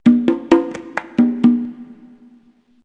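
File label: conga, loop, percussion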